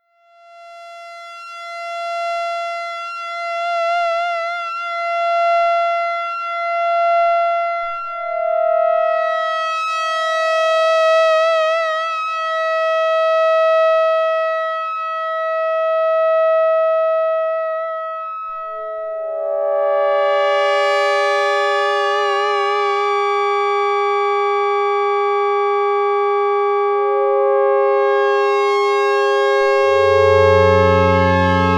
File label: Sequential Prophet5 BRASS and CS60 sequ